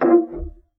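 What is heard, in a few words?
Ped Noise Etc Single Push, Piano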